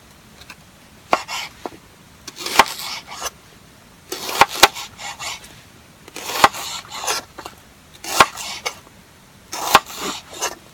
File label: Using a kitchen knife